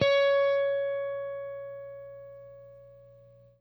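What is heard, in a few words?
jazz line, guitar; Quantum Leap - Guitar & Bass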